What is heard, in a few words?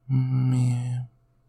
sleepy snore